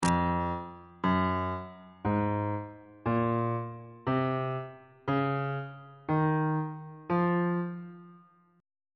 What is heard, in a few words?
f phrygian mode